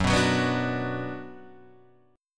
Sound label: Gb Suspended 4th Sharp 5th, Guitar, Standard; strummed; 2,2,4,4,3,2